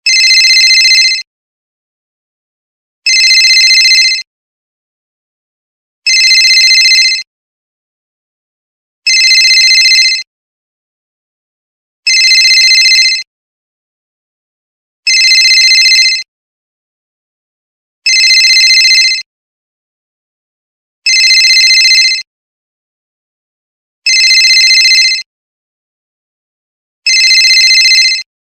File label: office old phone ringtone